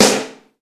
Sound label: piercing acoustic snare sample a sharp key, steel snare drum sample